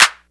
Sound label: hand clap sound g key; percussion; single hits one shots